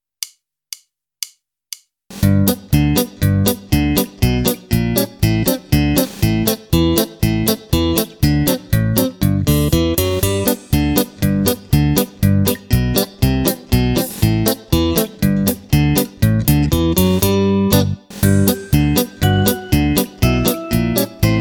polka

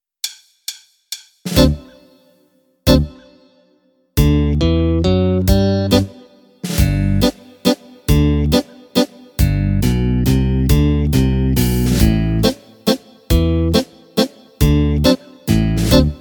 MARTINA Mazurka BASE

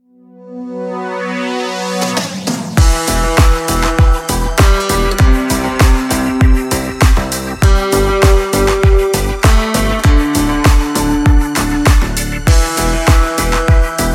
cumbia, base

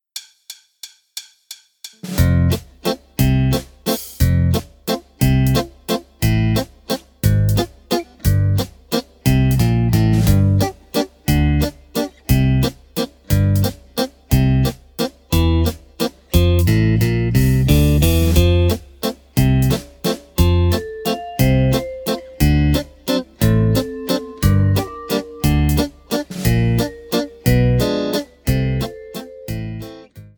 valzer, base

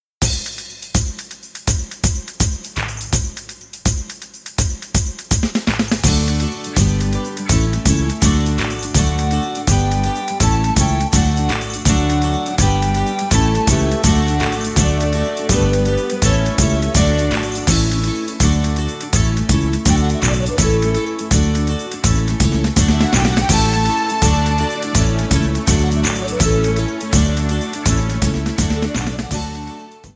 pizzica

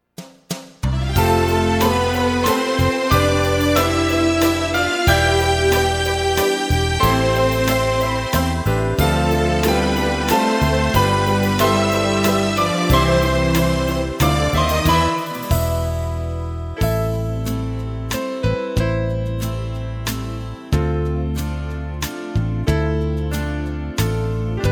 valzer lento

